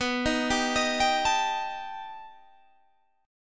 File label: B Augmented 7th B down Guitar Standard; arpeggiated; JGuitar